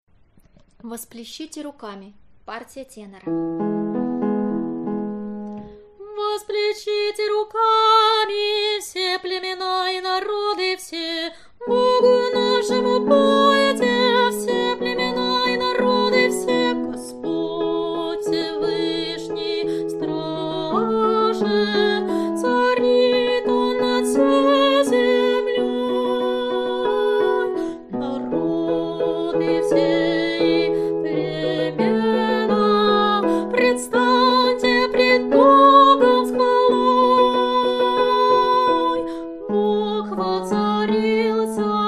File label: tenor; Vospleshite rukami